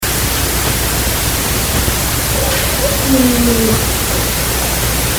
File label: voice, bigfoot